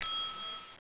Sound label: ring